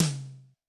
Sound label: Yamaha DTXpress-II Tom